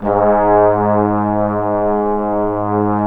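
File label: trombones; Roland - Brass, Strings, Hits and Combos; Bone Sec.3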